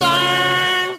"faen", Voice

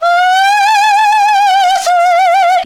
operatic, stabs

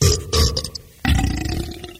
burp three bursts

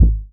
thunk; Heartbeats

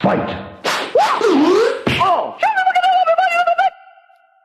martial arts

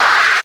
crash

